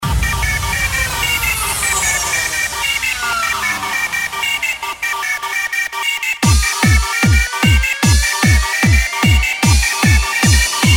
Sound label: rave samples